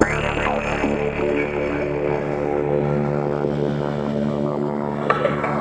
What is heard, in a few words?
SYN, Jungle; E-Lab; XTC Files of Jungle